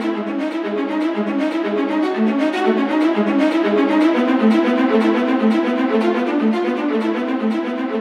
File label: viola; виола